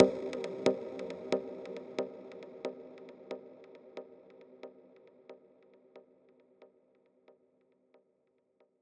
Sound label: 136 synth hit wet boxer Cmin; Hits